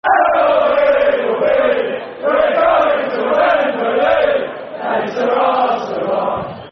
Football Chants; West Bromwich Albion Wba; fanchants

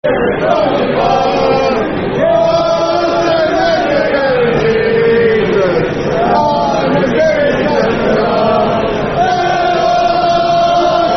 Chant Football Chant (Soccer Song); Eric Cantona Chant; Man United

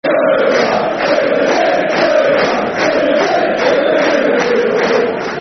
Super Jim, Football Chants; fanchants; Ipswich Town Itfc

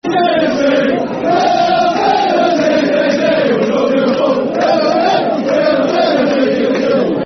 Football Chant; Soccer Songs; Famous Htfc; fanchants; Huddersfield Town Htfc

